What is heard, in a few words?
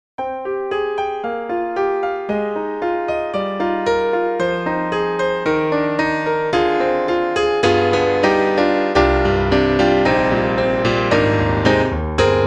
Roland INTEGRA-7 Rock Grand (SuperNATURAL Acoustic Tone)